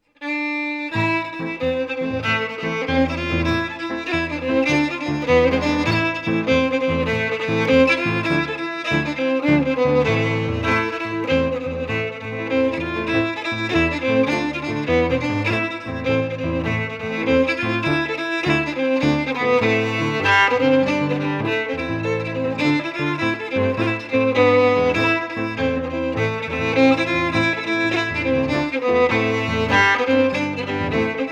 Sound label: FIDDLE PIANO; Jink & Diddle Book o' Tunes 2022